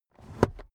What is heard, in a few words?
car headrest full adjustment quick